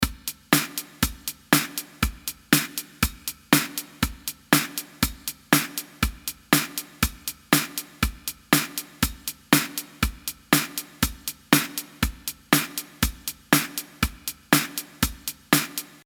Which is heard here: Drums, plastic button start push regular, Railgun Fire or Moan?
Drums